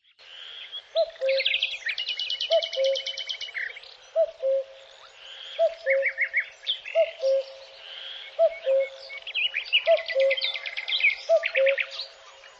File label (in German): Kuckuck